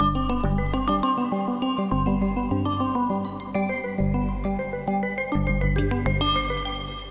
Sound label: Upbeat Mallets - GMaj 135 bpm; MIDI Clips; Tonal; Motifs; Ableton; Mood Reel